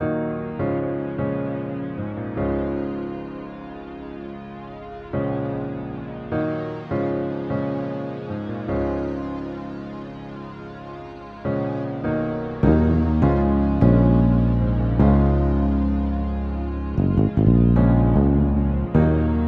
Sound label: 152 melodic loop D#min; tempesta